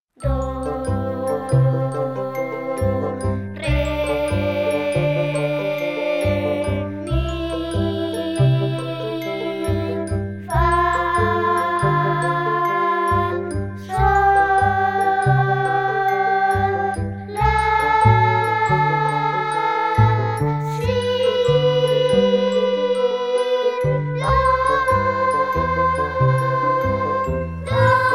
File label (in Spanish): Escalas instr y coro, fragm